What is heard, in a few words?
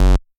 bass